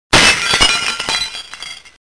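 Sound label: glass breaking sound; boli posui sheng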